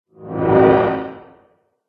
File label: dragon mage attack voice bottom